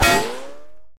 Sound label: bullet, ricochet; Weapons